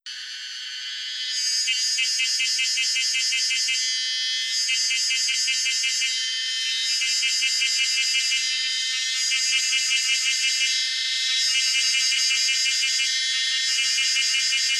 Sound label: cicada